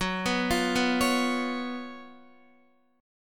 F sharp Major 7th Suspended 4th, Guitar; F#M7sus4 arpeggiated

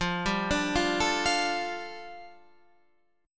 G 7th Suspended 2nd

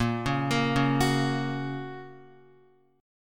A sharp Minor Sharp 5th A sharp down Guitar; A#m#5 arpeggiated; JGuitar

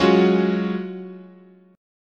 F sharp major 7th suspended 2nd, chord

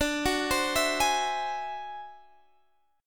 D 7th Sharp 11th D, chord

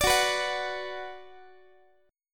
Gb Suspended 4th Sharp 5th Gb, down, Guitar

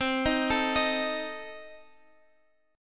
C Minor 6th, C, chord; arpeggiated; Cm6; 20,18,19,20,x,x

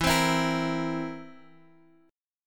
A sharp Suspended 2nd Flat 5th E

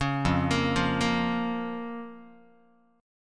F Suspended 4th Sharp 5th, down, Guitar; arpeggiated; 4,1,1,3,2,x; JGuitar

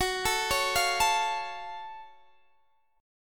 F sharp Minor Major 7th F sharp Guitar Open D

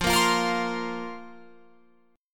F Suspended 4th F down Guitar Open F

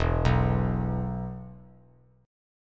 E 5th; arpeggiated; JGuitar

